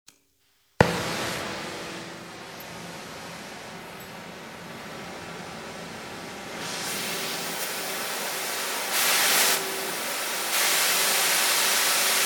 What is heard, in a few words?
burner